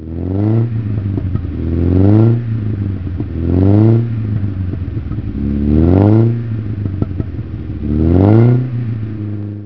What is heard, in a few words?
facellia